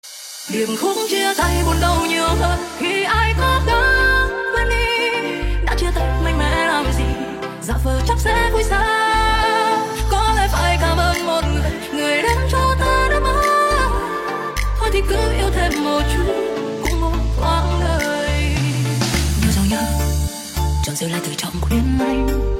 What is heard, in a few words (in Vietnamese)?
nhac chuong diep khuc remix